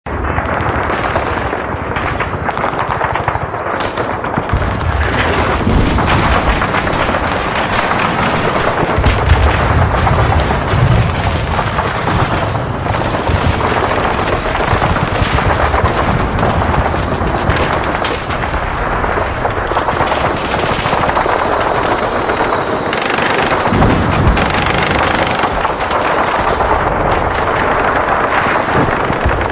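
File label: Intense city battle ambient; war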